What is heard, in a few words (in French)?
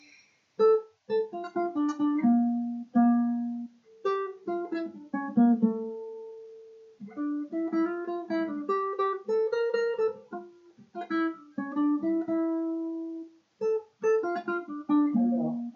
deux guitares